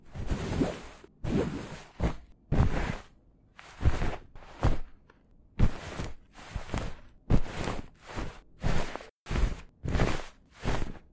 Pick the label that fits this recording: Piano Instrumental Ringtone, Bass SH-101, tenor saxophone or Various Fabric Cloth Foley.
Various Fabric Cloth Foley